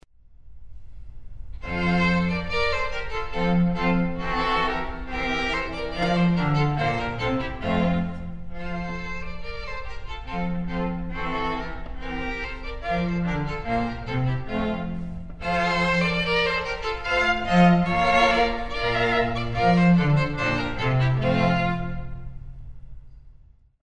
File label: Alleluja, strings